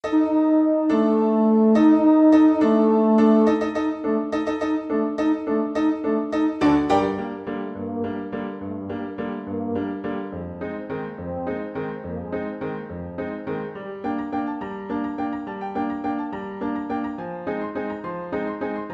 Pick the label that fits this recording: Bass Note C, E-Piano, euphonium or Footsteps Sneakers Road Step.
euphonium